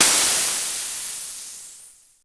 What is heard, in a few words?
FLAT TIRE; Rabbit-Wars